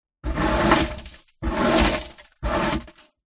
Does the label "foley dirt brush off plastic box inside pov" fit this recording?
Yes